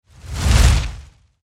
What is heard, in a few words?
Fire Torch Pass By Large Heavy Crackle Whoosh; Flames